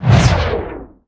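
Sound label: Quick skill Kill Drain; Gamigo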